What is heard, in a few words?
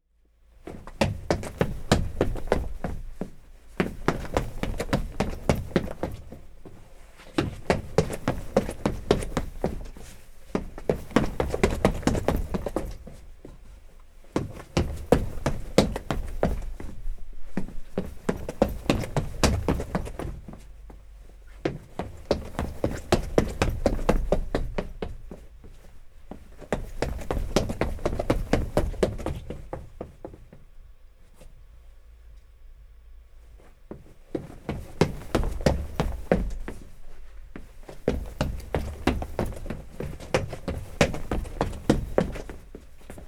run